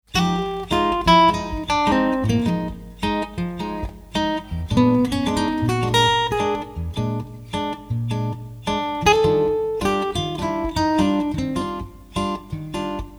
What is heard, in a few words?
Call response (Eb)